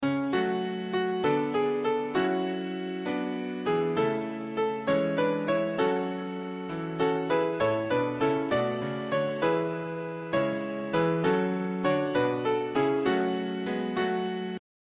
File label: Piano